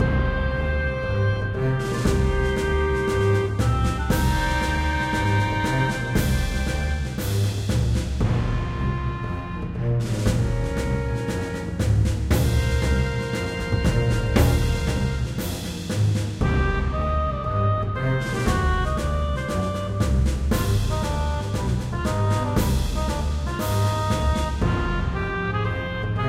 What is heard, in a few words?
music evil level; vikings staemme des nordens windows 2012